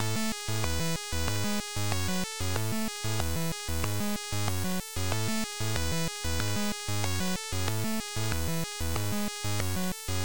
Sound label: Intro, Beeper